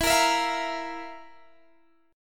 F Major 7th Suspended 4th E

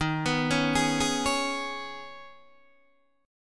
Eb 7th Suspended 4th Sharp 5th, Guitar, DADGAD

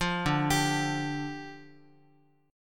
D Minor Double Flat 5th, down, Guitar

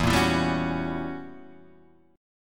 Gb 7th Flat 9th E, guitar

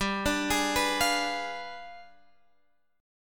D Diminished 7th G

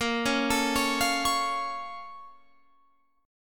A sharp Minor Major 7th, down, Guitar, Open F; A#mM7 arpeggiated

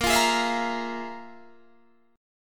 F Minor Major 7th Double Flat 5th

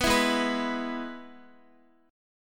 Ab Minor Sharp 5th Ab down Guitar; Abm#5; strummed

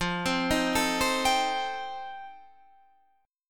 F 9th F Guitar Half Step Down, chord

